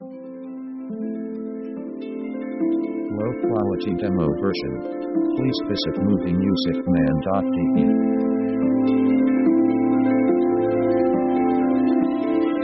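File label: orchestra harp harmonics; contemplative themes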